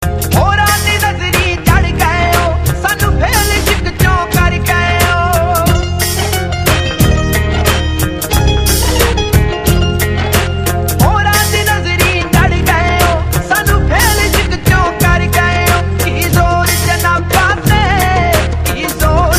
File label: Mobile tone